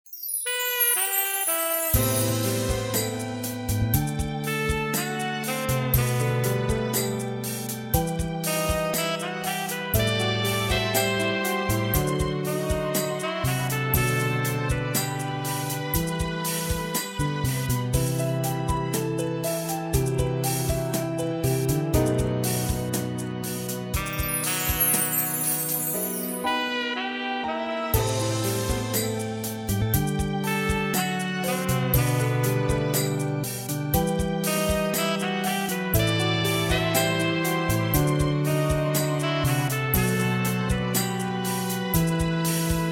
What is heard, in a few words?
sax